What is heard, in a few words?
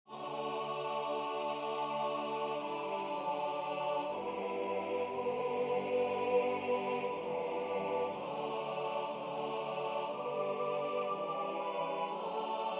Deep river SATB